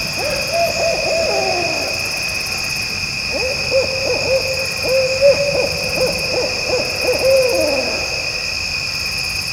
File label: Owl Call